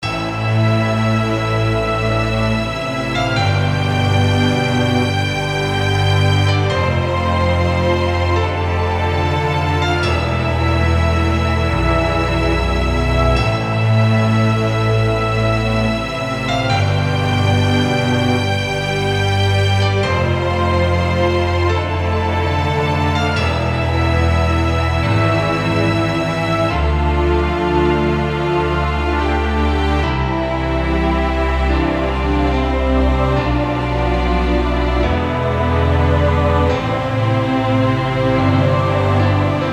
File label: Howling and Raging Wolves; Evolimit